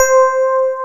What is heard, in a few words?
DX EP C4; AKAI S-Series CD-ROM Sound Library VOL-1